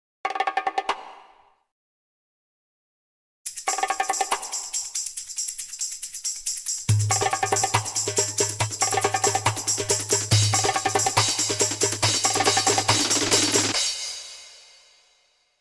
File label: bongo alarm